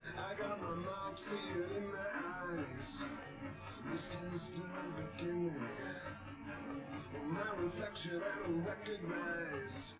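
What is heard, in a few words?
with drums live rec; SiSEC10; underdetermined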